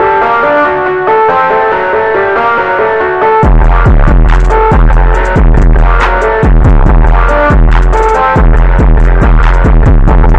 indian rap epic drop

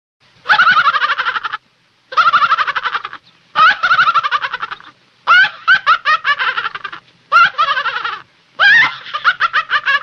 cheetah laugh

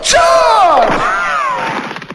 charge wow

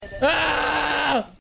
battle cry

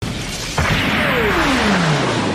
beam magnum; unicorn gundam

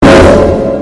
big suspence

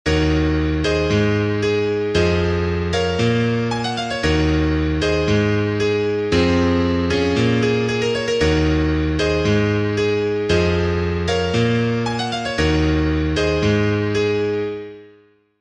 pianoforte

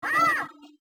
scream

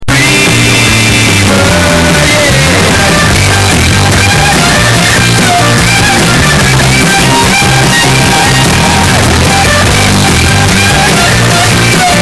bass

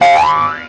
cartoon boing

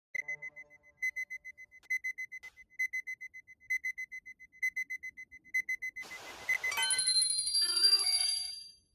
message tone and menu opening; sao

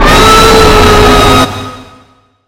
screamer

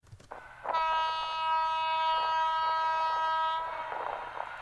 honk

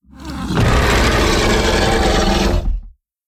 herbivore roar

